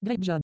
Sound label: overheat warning